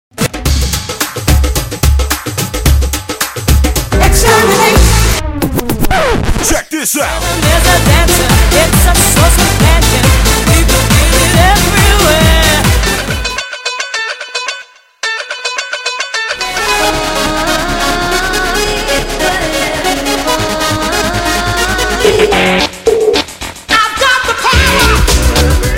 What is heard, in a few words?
dance